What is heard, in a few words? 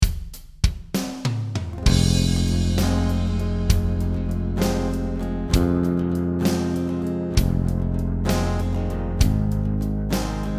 Acoustic Waves; Rock